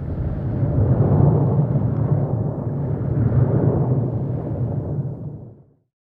damage hits explosions, Warships

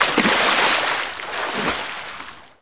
sewer splash; gulag; Call of Duty Modern Warfare

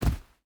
footstep hide sand jump land; Rust

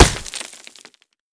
concrete; Escape from Tarkov

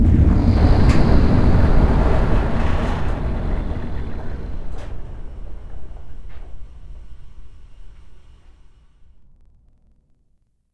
Submarine Run End; Civ4 beyond sword

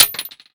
556mm shell metal; Escape from Tarkov

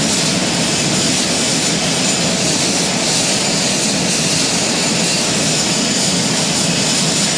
Aircrafts Engines PT6A-25C Front Engine; DCS

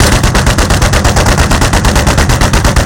gepard KDA; Weapons; DCS

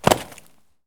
landing concrete; Escape from Tarkov

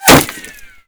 impact masonry; weapons; Modern Warfare

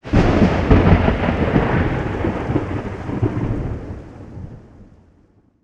ambient battle; World Of Warships

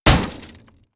hit, damage; DCS

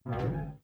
door metal close start; Rust

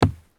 sprint wood; Escape from Tarkov

